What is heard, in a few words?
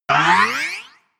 spring